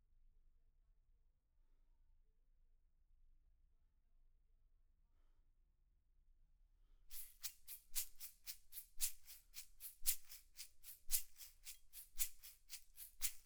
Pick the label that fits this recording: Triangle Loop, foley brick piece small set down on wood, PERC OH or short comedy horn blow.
PERC OH